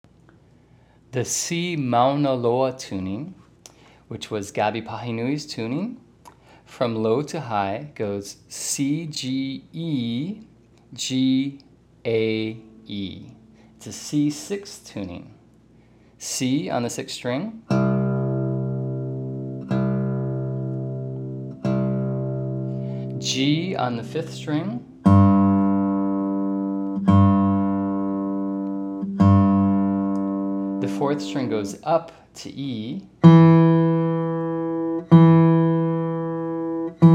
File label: C Mauna Loa tuning, guitar